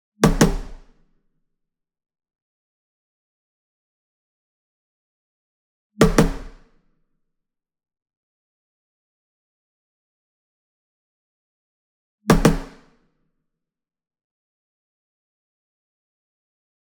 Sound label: Single Drum Shime Daiko Double Near; boom library; Cinematic Strikes Construction Kit